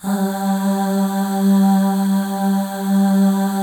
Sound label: AH FLAIR, FEM; Spectrasonics; FEM.2 AHS